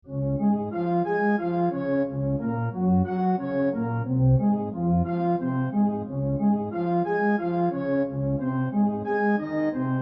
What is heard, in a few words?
organ in d major